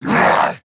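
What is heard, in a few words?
pain, zombie male; nmr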